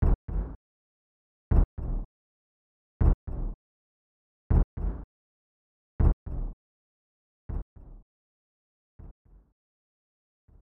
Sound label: Unknown Ambience; 3x3 Eyes Seima Kourinden; SNES